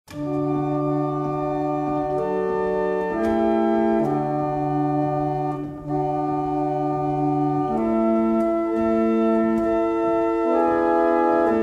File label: Double chant, barrel organs; Wardley; Boyce